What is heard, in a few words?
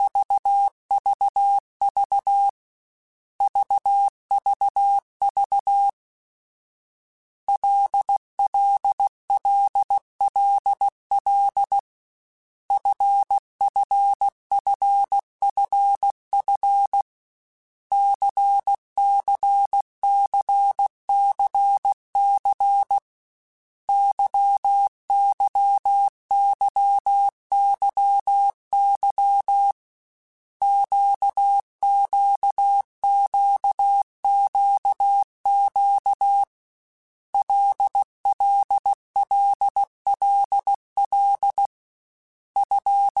LFCYQ, Morse Code; HamRadio; Basic Sets